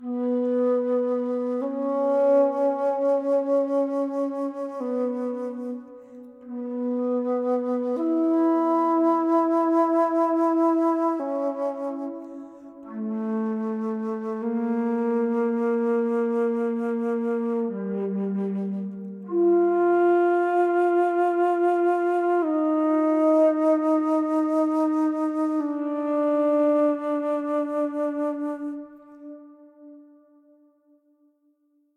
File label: Alto Flute Stem; ps4 ps5 switch windows xbox one xbox series xs gamerip 2024; Grandpa's Note - Loop 4